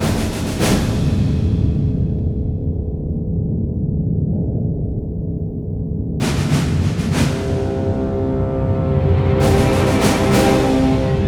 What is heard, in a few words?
Tension; Rebel Raiders Operation Nighthawk; PS2, Wii, Windows